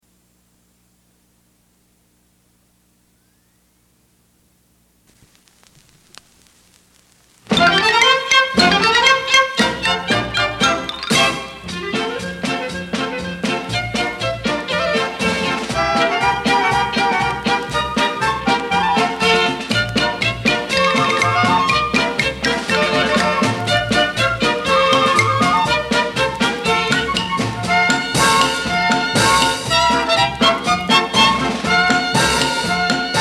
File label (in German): Zigeuner Polka